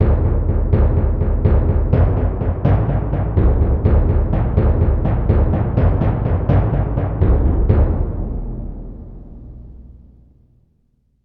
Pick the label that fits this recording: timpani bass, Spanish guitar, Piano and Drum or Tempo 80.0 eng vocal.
timpani bass